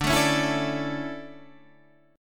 D Major 7th Suspended 2nd, chord

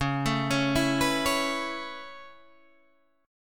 A Major Add 9th C sharp; Aadd9/C# arpeggiated

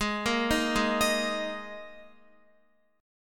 G sharp Suspended 2nd, G sharp, down, Guitar; G#sus2; arpeggiated; JGuitar

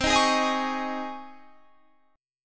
C sharp Major 7th Suspended 2nd, C

